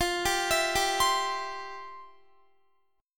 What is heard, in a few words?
F Major 7th Suspended 2nd Guitar; arpeggiated; 4F,4A,5C,5G,5C,6E; FM7sus2; JGuitar